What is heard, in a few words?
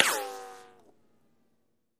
Bullet Ricochet Sin